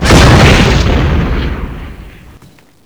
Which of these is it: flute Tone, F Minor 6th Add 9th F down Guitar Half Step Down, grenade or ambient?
grenade